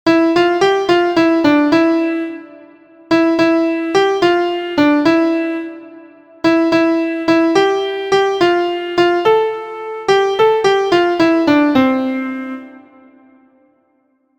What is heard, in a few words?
MIDI